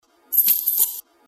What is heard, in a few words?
shake